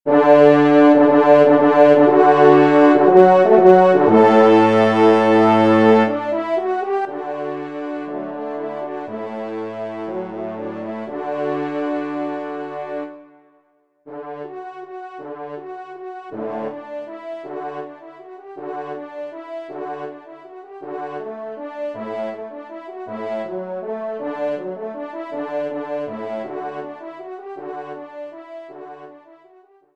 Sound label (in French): trompe; L'Andalouse; J. Amelin